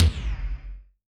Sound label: Perc, drum